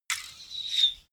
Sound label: bow and arrow fire fly; krieg-waffen; pfeil-bogen; soundpool